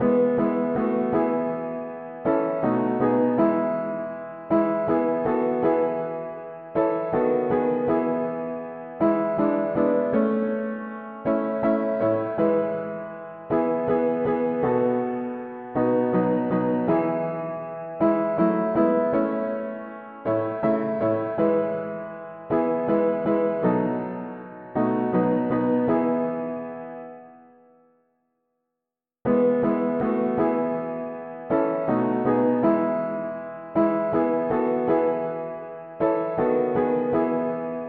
mono-piano, hymns

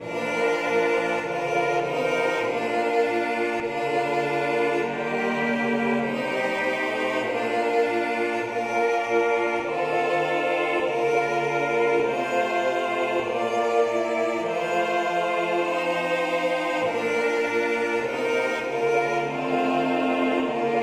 polyinstru; hymns; High in the Father s house above

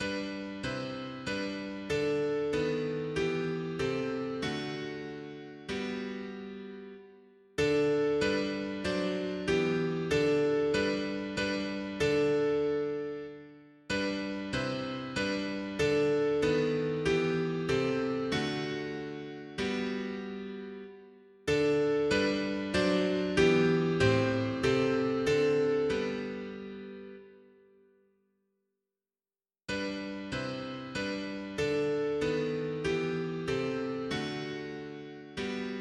mono piano, hymns; Tes paroles toujours

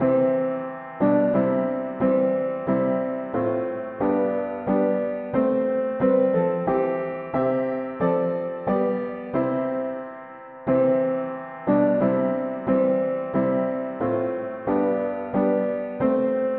mono piano; hymns; God our Father we adore Thee

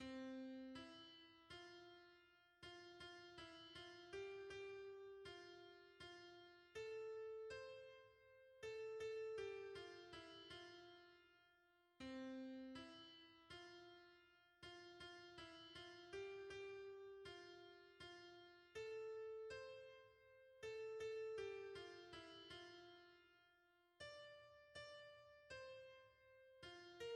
soprano; hymns; In the Lord we have Redemption

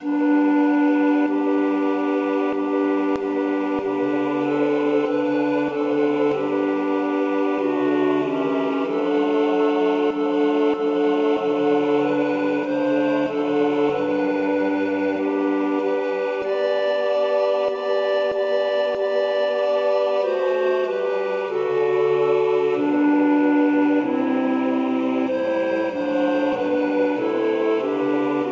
polyinstru; hymns; How good is the God we adore

